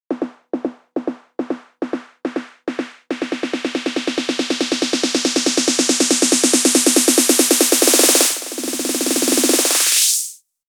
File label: 140BPM SNARE 6Bars; Vengeance Samples; Snares&FX Pairs